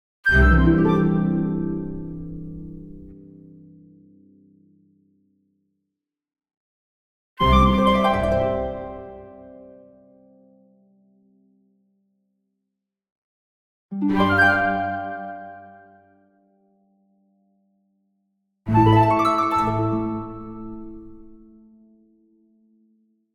Orchestral Jingle Positive; boom library; The Interface

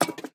Peg Leg Footstep; Pitch Black - A Detective's Demise

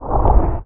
unhinge; Apotheorasis

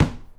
Street Ball Hit Pad; SFX Kit

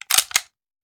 Machine Gun Foley; Sound Ideas; Guns

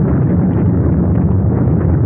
Scifi Space Rumble Loop; SFX Kit; Sci-Fi Loops

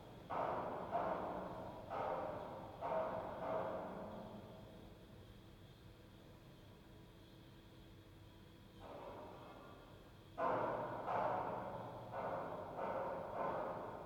large hall loop; Audio Game maker Sound Library; worldsounds

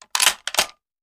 Rifle Foley; Sound Ideas; Guns

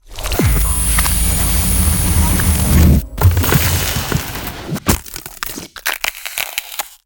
Ice Spell Damage AoE Ice Shards Rain; Arctic Aura

